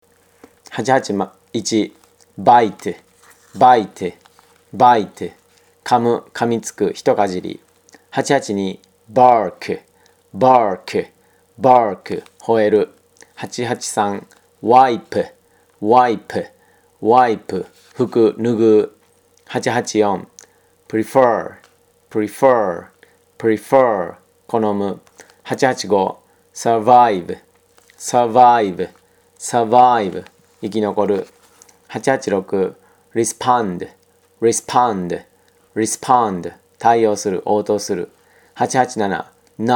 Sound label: tango